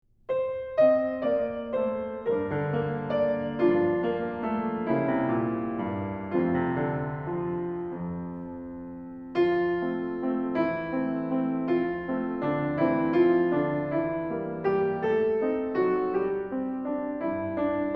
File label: Accompaniment